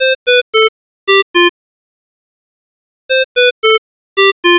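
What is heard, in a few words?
oxygen high, alarms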